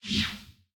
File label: recall rise; Smite; Baron Samedi